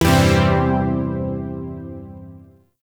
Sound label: Shawzin Pent Maj Chord F; Chords; Warframe; Zariman